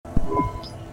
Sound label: answer call; cloudpunk